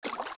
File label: Steps Deep Water; Zelda; OOT